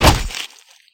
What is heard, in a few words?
hit flesh, knife; csgoknife